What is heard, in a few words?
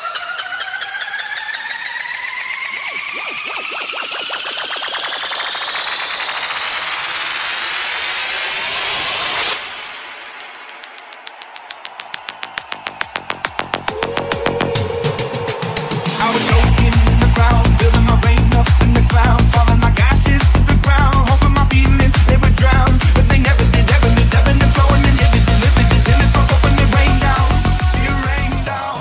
bgm ringtone, english ringtones